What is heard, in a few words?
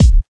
punchy kick drum sound d key, kickdrum tuned to the D note